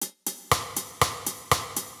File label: Transition, batteur